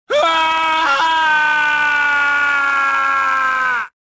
falling; Star Wars Jedi Knight II Jedi Outcast; XBOX; bespincop

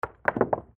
dice roll; craps

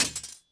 sword drop concrete; objects; Star Wars Obi-Wan; XBOX

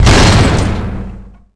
hit cell door; hits; Tony Hawk's Project 8; XBOX

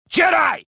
anger; reborn

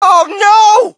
crowd, shout; Mike Tyson Heavyweight Boxing

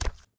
footsteps; trando; Star Wars Obi Wan; XBOX

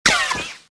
reflect, blaster; weapons

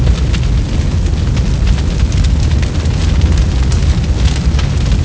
large fire; The Elder Scrolls III Morrowind GOTY; XBOX

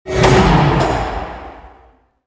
platform stop; movers platforms

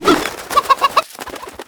Chicken Shake; PowerUps

